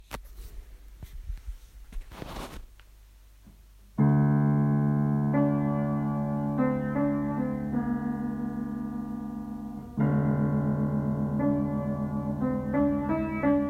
Organ

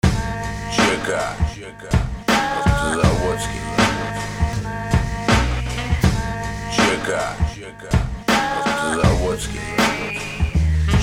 instrumental

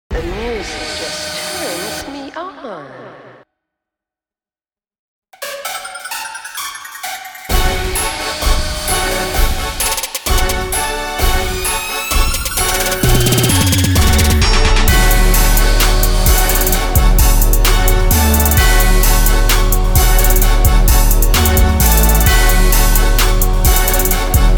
Trap

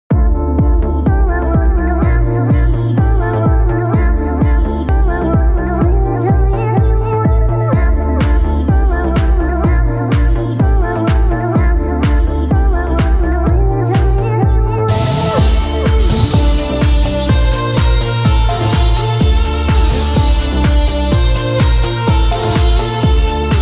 electric guitar

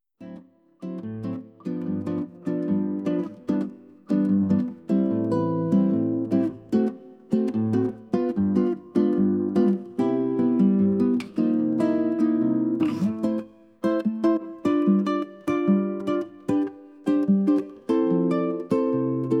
Bossanova, Samba

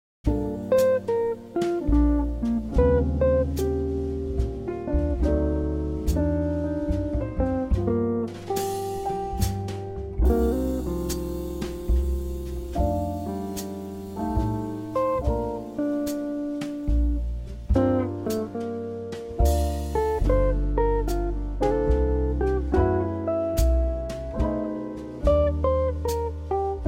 Background Jazz

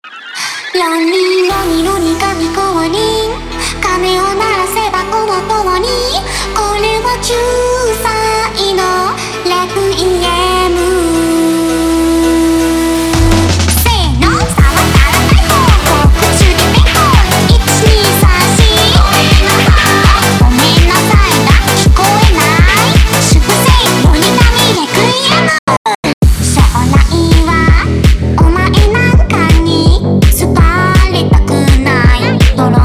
Phonk

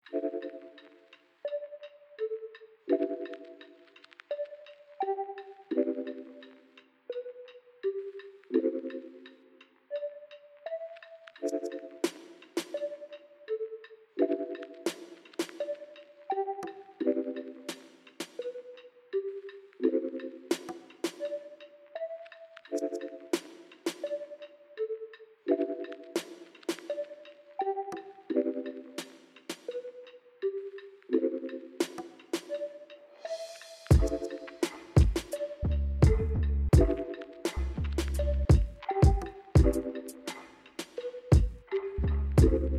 LOFI

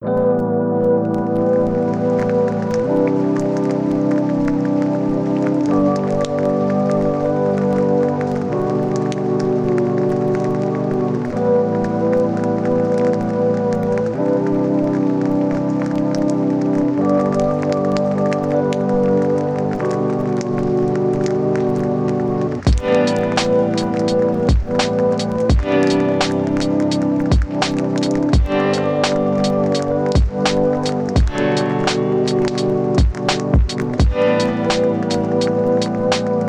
Lofi Hip Hop Instrumental

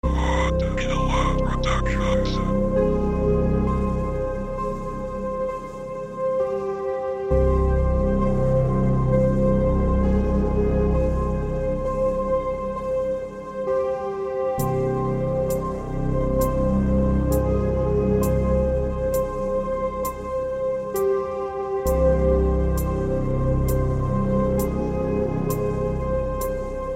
Beat Instrumental

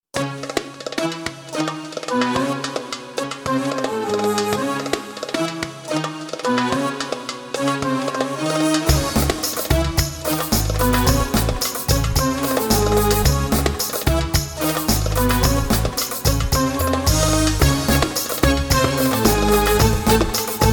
Music Arabic

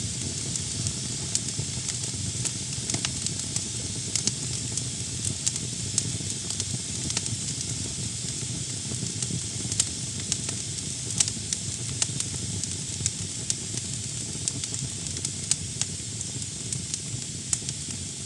Camping Kettle on the Fire

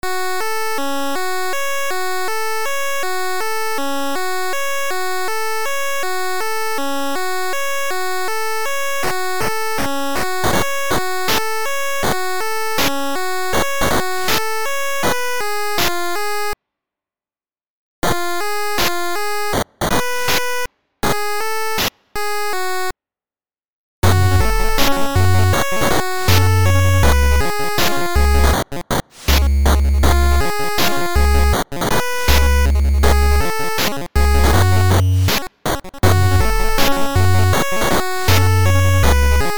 8bit

